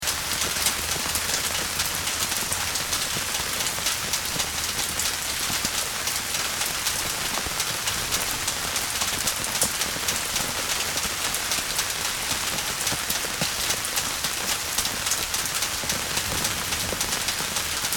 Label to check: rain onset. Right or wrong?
Right